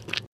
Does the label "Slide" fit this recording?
Yes